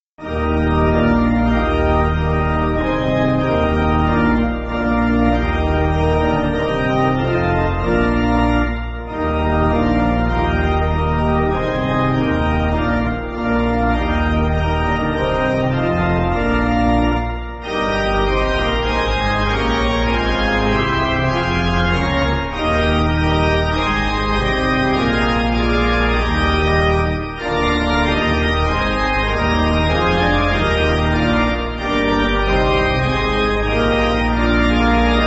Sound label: Pipe Organ, Music Backing; Glory Be To God; Hatfield